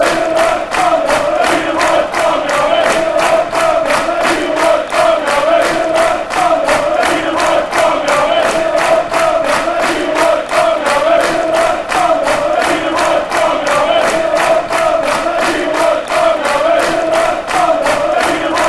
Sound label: green and white barmy army, fanchants